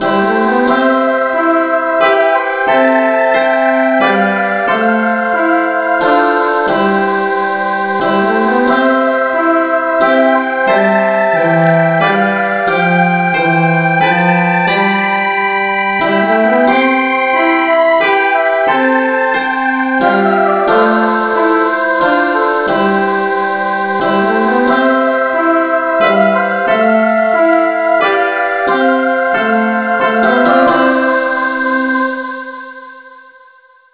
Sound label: no vocal key c